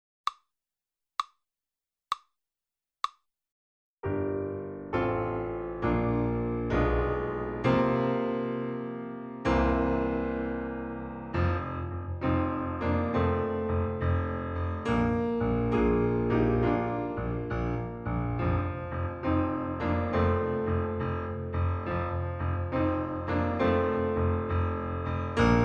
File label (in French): blues accompagnement